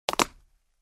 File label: Jump on the marble floor with shoes; steps